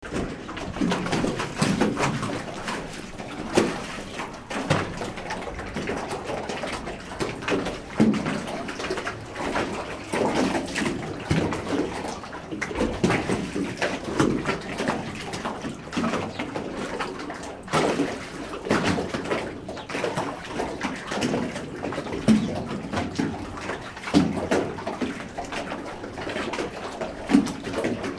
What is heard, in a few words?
banging water under metal Ponton Boat